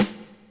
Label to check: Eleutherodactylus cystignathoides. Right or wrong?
Wrong